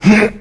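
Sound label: cliff big jump player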